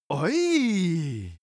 male cheer mildly "hey"; koerpergeraeusche; menschen; soundpool

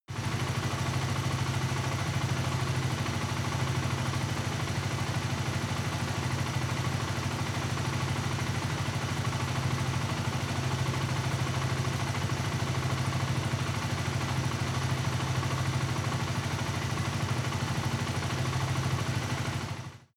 motorcycle engine idle; motorraeder; verkehr transport

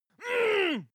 male attack groan short; schreie; menschen

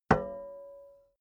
plate hit ring damped soft

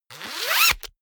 zipper pullover jacket close accelerate; objekte; reissverschluss

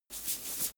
makeup cotton stick brush sequence; haus-garten; badezimmer

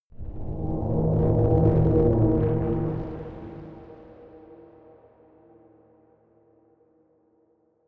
bubbling rumble chord, drones; musikelemente

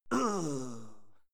male fight attack moan; schreie; menschen; soundpool

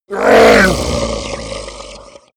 orc die

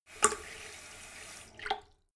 sink fill splatter light short; haus-garten; badezimmer

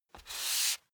step barefoot wood parquet slide medium; Footsteps; schritte

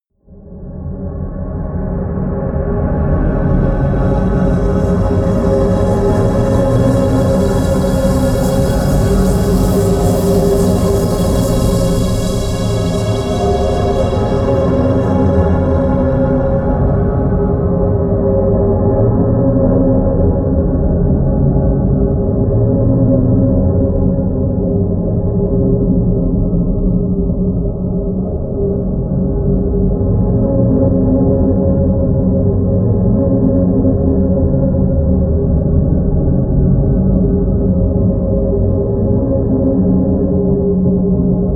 drone moving air high

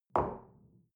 shell place on table soft short; berufe; gastronomie; soundpool

